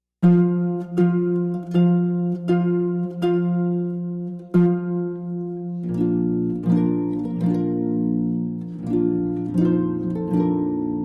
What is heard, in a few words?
Bridal Chorus, harp